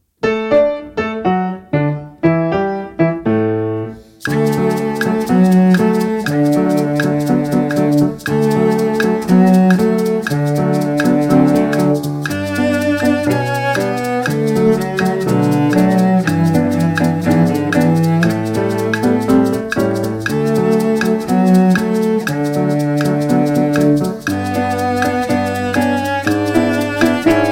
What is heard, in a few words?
calypso time cello